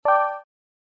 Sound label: bottle Dynamite